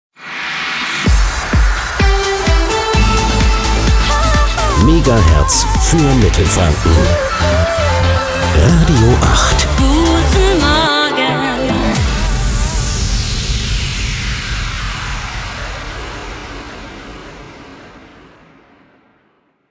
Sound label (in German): Transition Radio Fast Pop to Medium; Radio 8; Guten Morgen